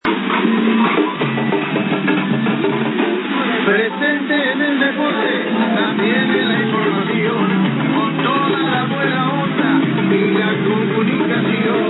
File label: Stn Song; Radio Continental